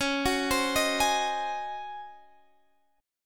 Db Major 7th Suspended 2nd Suspended 4th Db down Guitar Open D